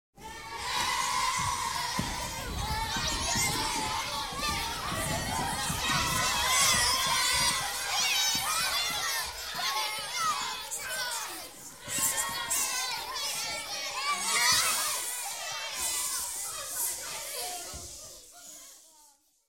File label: Babies And Children - Hysterical Children In Class, Quietened At End; Human Crowds, Children & Footsteps